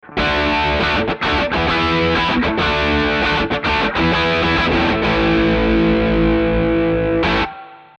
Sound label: BM9 Crunch E Guitar 13cm